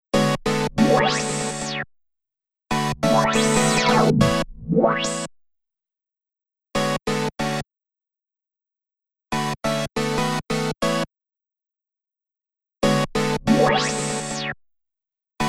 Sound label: Synth Poly; H3000 BD Mk II; depthcharge2